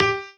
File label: piano melo